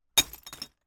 impact heavy object throw onto broken glass debris